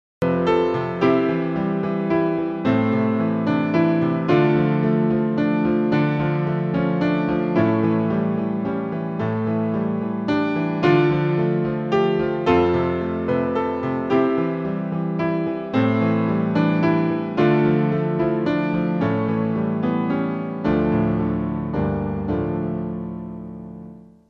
melodia acompanada; greenslaves

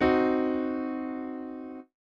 5 disminuida

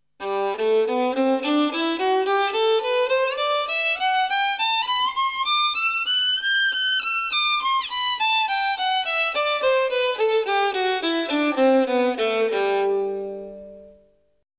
escala asc desc

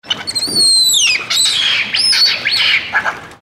mono

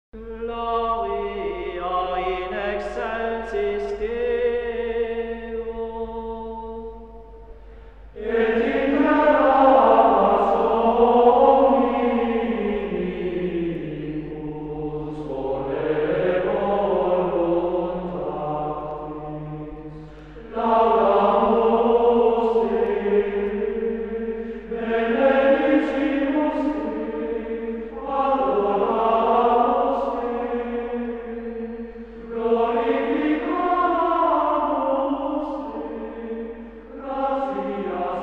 Monódica, Gregoriano; Gloria.Gregoriano anónimo

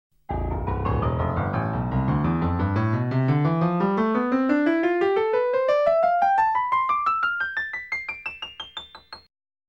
escala ascendente

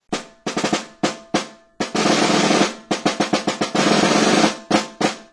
caja, instrumentos de percusión; Clasificación de instrumentos

